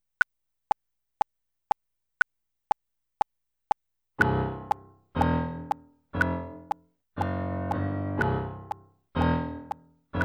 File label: secondo